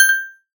spinner bonus; Luxariah Default Minimal

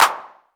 normal hit clap; JesusOmega {NM} 『Planets』; aesthetic

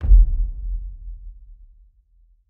heartbeat; Luxariah Default Minimal

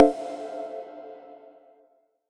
menu options click; megamix

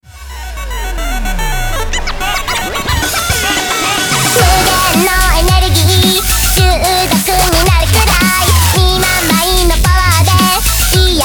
applause; Eliteronix

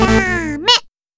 section fail; WMountain 3D Skin

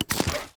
menu edit click; 『Mikan』

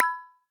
menu hit; Tippy

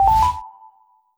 menu options click; 『DTMoon』

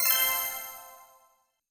spinner bonus; AriizuCA's Skin V3.17.6 Tail Yellow

